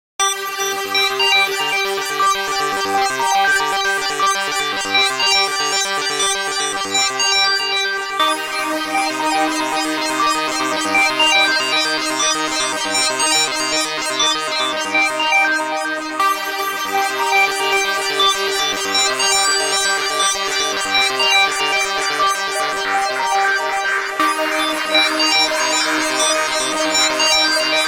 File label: Digital Thunderstorm